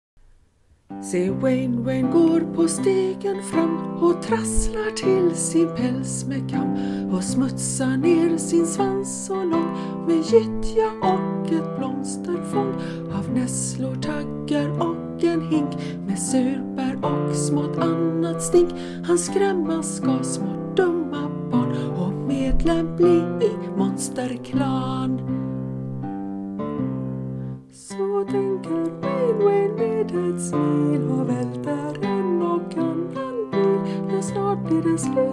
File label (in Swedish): gitarrkomp